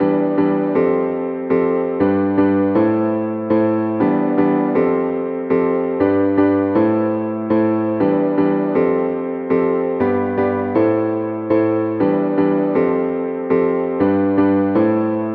Avenger ピアノ

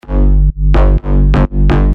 サブベースサンプル

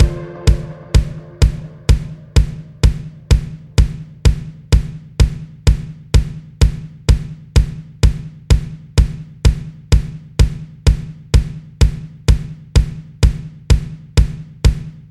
アコースティックキック; edm-instruments

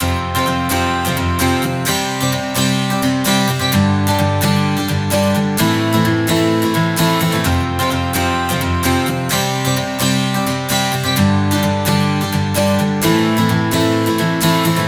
ギター

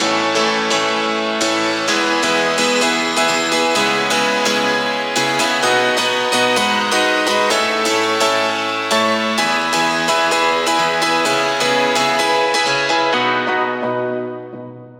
シンセティックピアノ使用例

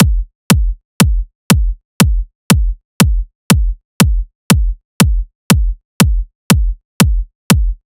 トランジェントキック

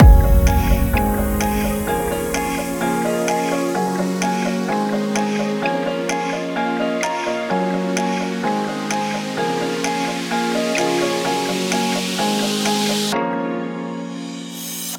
グランドピアノ使用例; edm-piano

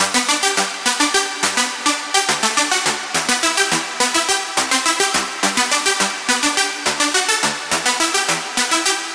アルペジエイターコード

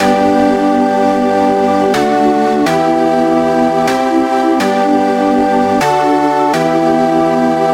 Nexus 例 プラック → パッド

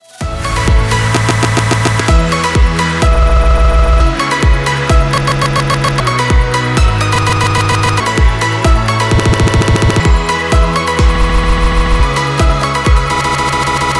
リバーサー; kn-method

